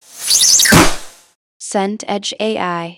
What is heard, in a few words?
umbrella open